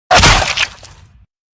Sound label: cs go headshot sound